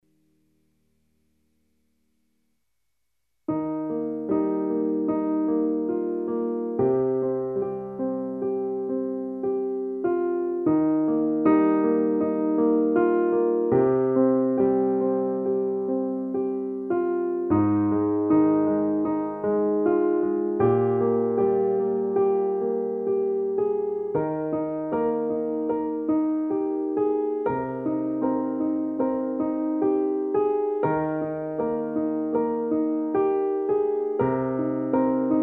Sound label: Piano